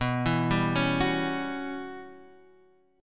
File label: E Minor 6th Add 9th B chord